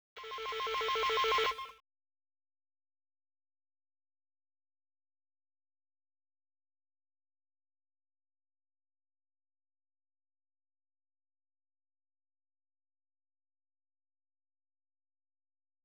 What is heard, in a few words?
sfx